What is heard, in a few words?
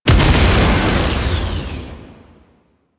Rescue Release; Kahl Brother; Warframe; Veilbreaker; Devourer Kahl